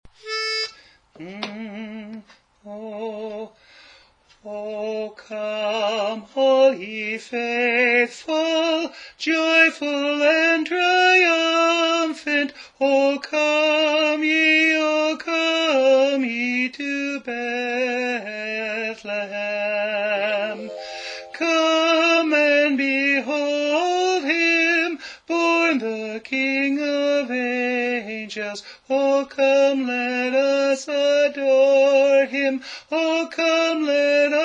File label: Tenor; O Come All Ye Faithful